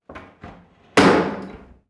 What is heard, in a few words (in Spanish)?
cerrojo puerta